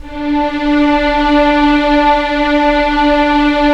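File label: Strings Violins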